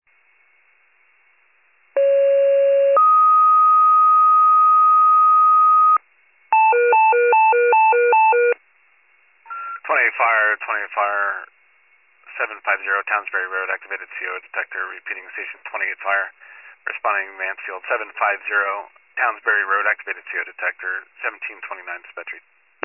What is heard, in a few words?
FIRE pager alert